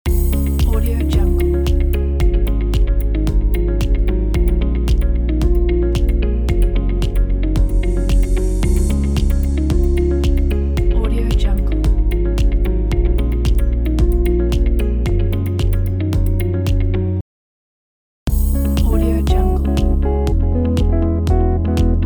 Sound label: corporate loops pack